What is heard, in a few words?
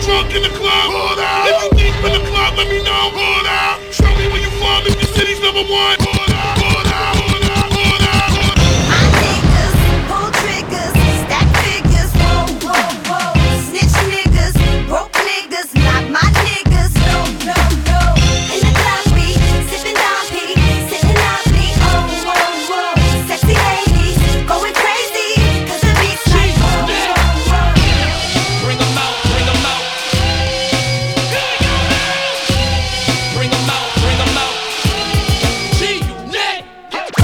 Rnb dancehall selection